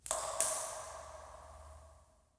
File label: Cave Drip; water splashes